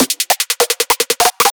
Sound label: 150BPM Drum Fill; Antidote Advent; Infected Track Drums Ableton Project